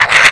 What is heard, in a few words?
SKULL BAT attack; Eft